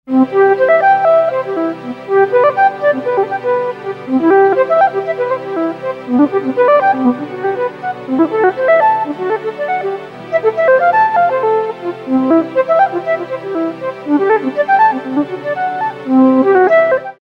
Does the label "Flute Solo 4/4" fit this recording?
Yes